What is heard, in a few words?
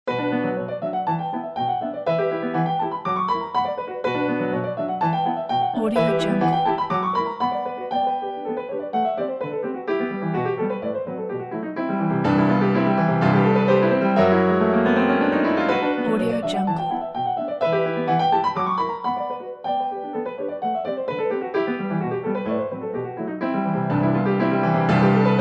Schumann's Kinderszenen No 3 on piano; Blind Man's Bluff